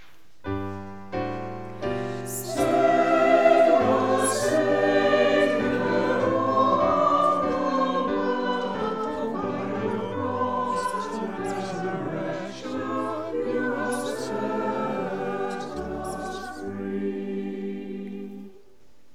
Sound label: acclamation choir and piano; Heavenfield mass